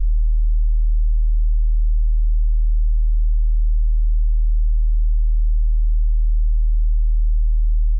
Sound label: Deep Bass